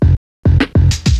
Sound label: Urban Beat, Drums